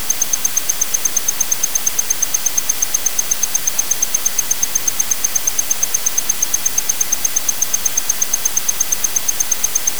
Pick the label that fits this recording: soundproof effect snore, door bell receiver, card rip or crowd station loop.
door bell receiver